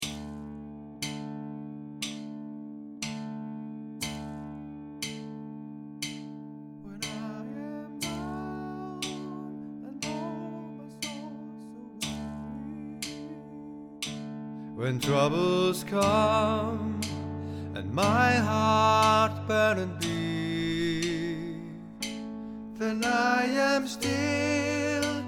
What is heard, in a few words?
Singalong Tenor